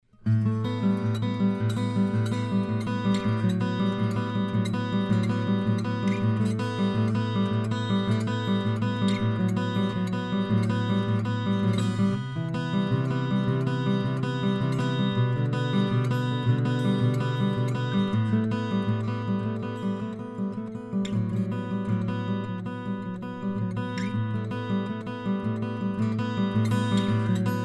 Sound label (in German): Akustik Gitarre; UA Apollo Twin